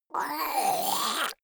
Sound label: monster gurgling high; kreaturen